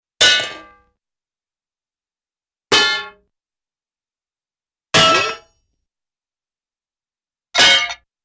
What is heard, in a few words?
Metal Clang Bonks, cartoon; Hollywood Edge; Cartoon Trax